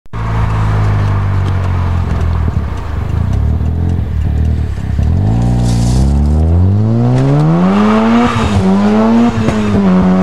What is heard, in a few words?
sa22c, Rx7 GT 12A Turbo; First Generation (SA22C)